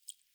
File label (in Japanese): しぶき 短, ぴゅっ 短め